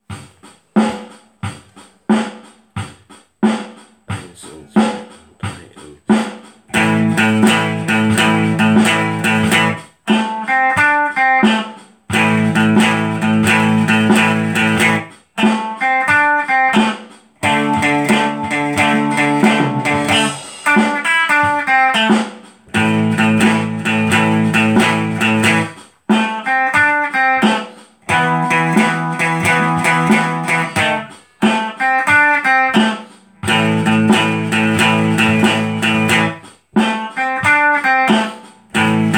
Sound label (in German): blues solo 6 e drum backing track 90bpm